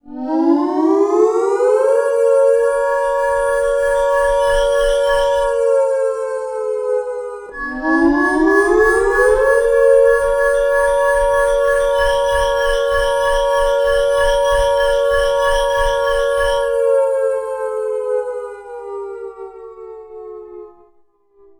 TECH FX

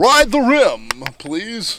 RIDE THE RIM, 88 bpm, Breaks; POTBreaks

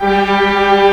Organ, Big Barton Pipes

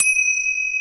RAMA CYM, TIBETAN BELLS; Crypton - DrumBase5000

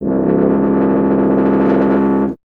BRS TUBA, Solo Instruments

